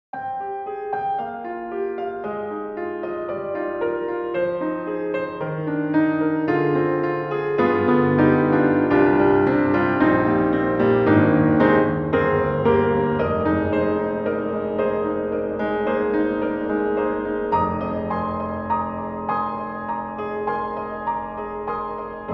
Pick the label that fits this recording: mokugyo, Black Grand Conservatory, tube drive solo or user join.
Black Grand Conservatory